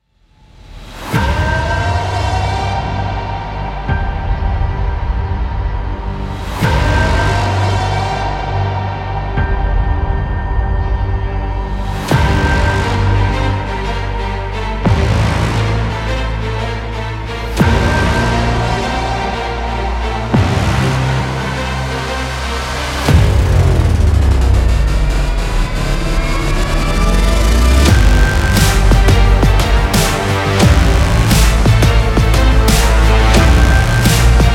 Dubstep Trailer; powerful dubstep tracks

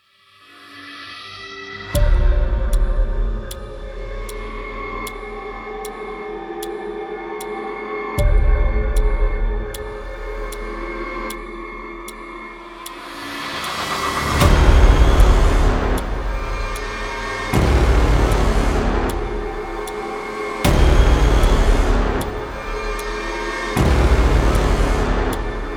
Horror, scary background music

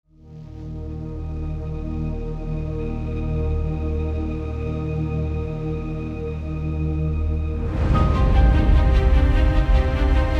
Epic Cinematic Trailer; motivational epic music

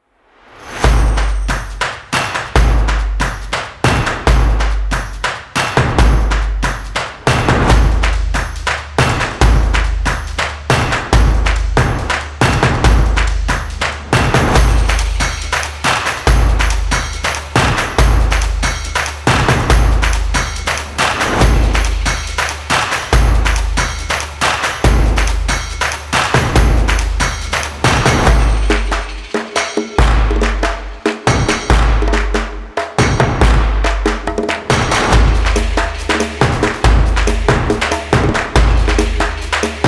Powerful Percussion; energetic

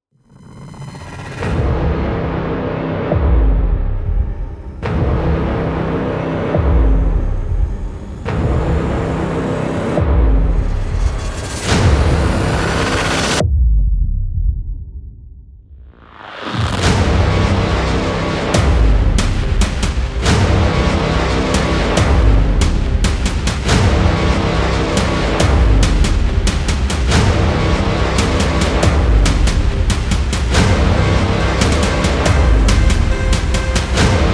Blockbuster Action Music; intense trailer themes

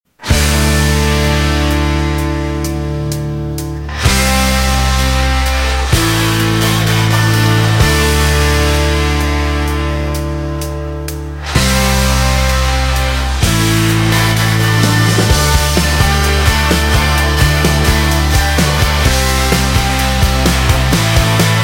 Inspire Epic Rock

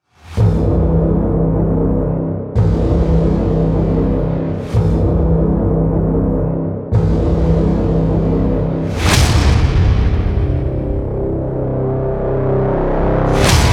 Action Blockbuster Cinematic; intense trailer themes

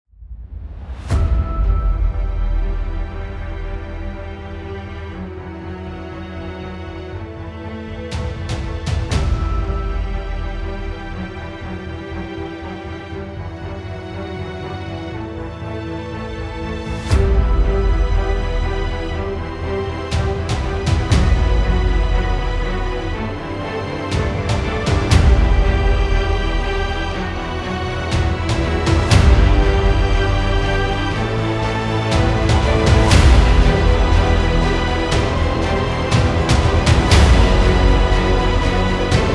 Adventure Epic Music; epic background music

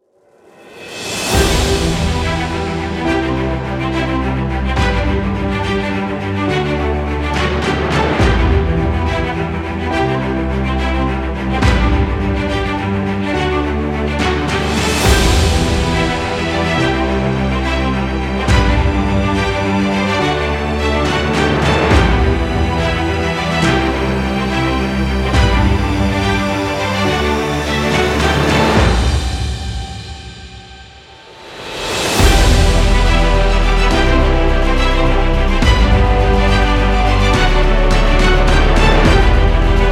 Cinematic Heroic Background; epic movie trailer music

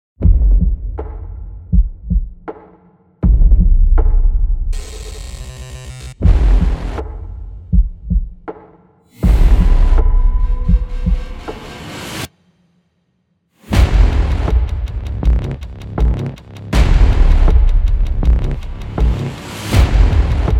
Battle War Intro; intense trailer themes

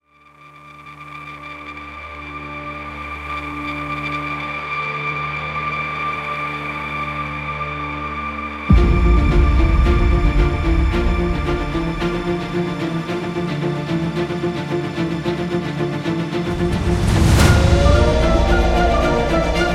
Epic Cinematic; motivational epic music

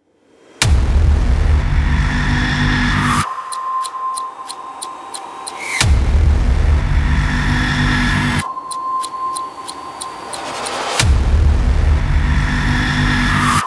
Countdown Horror Teaser, scary background music

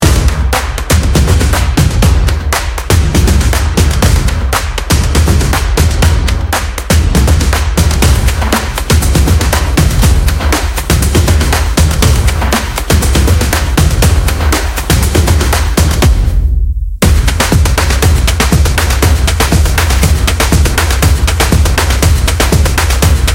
Stomp Action; energetic; energetic percussion music

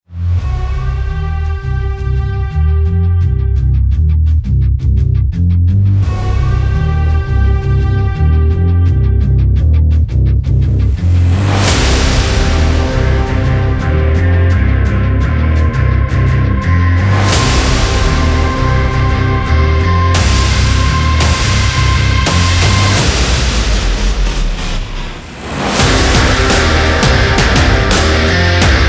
Sport Intro; background music

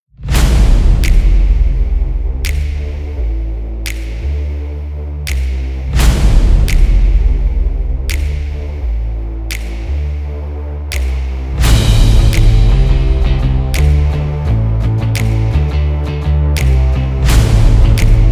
Upbeat Rock Traler

